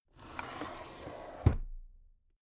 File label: drawer cabinet stuff close soft